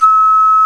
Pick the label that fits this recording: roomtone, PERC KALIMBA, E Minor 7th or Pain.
PERC KALIMBA